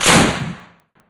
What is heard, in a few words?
gun; mcheli